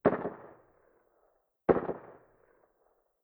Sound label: fog shooting